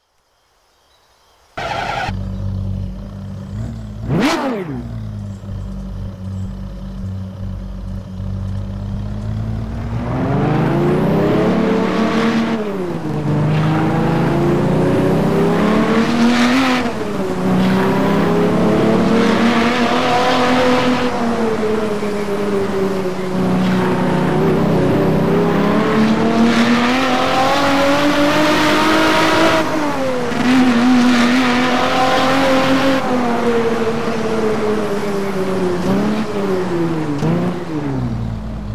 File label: ferrari laferrari; Ferrari F430; TDU-1